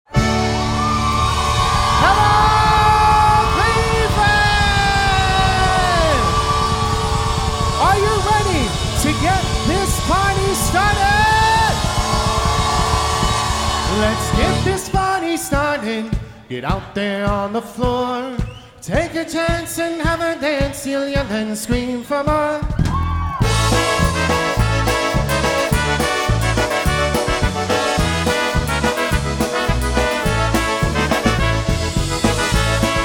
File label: Polka Medley